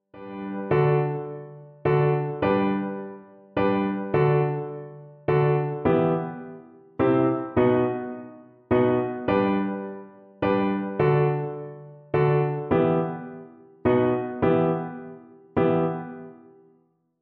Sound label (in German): scherzando g dur kl